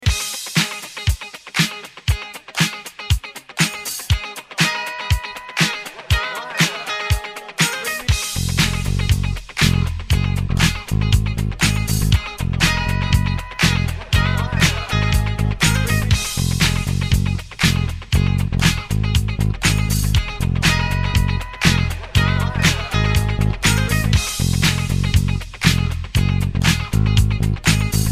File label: bass